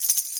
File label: sistrum; Kenya Sistrum